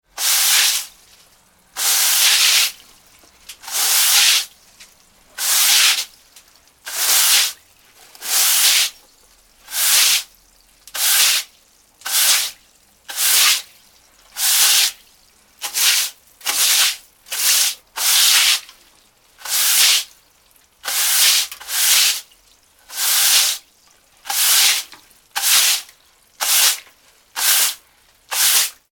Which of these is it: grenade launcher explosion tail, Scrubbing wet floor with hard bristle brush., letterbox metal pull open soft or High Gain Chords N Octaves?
Scrubbing wet floor with hard bristle brush.